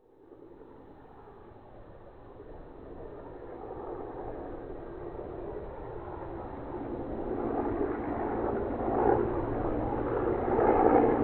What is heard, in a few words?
soundscape far chopper